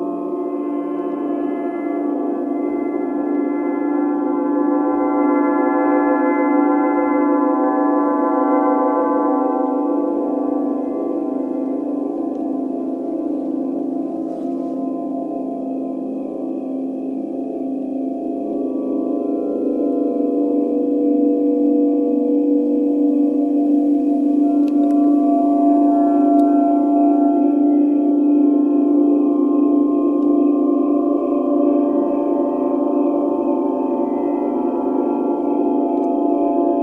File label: Spirit of the Wind